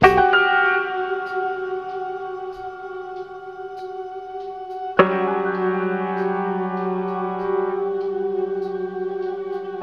piano and keys, Sad; loops

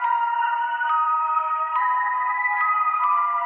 strings, Sad Loops

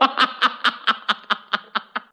laugh maniacal; ooh ahh

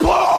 puh; ooh ahh

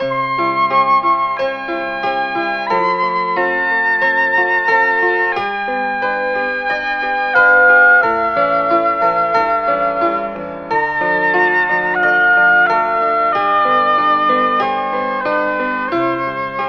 sax and piano, loops, phonky

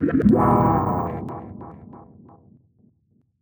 puff, zaps and beeps

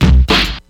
loud smacks, Kick Snare; Drum loops

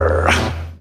growl male

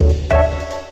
burpy

